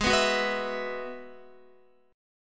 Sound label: G sharp Minor 7th Sharp 5th, Guitar; G#m7#5; strummed; x,11,11,11,11,12; 4F,4A,5C,5G,5C,6E; JGuitar